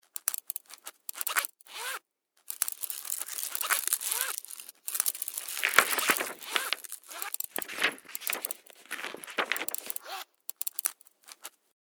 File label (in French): reveil